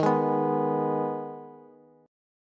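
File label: E flat Suspended 4th Sharp 5th, Bass; Ebsus4#5 strummed; JGuitar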